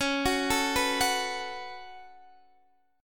C sharp 7th Suspended 4th Sharp 5th, Guitar, DADGAD; down; chord shape